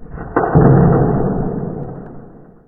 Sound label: strange noise, underground